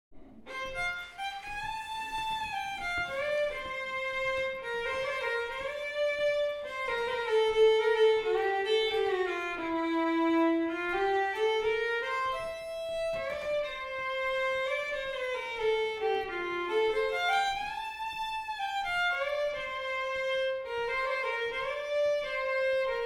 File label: waltz